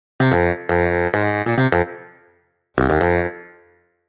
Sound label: baritone saxophone